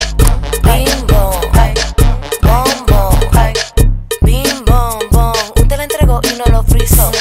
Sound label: bing bon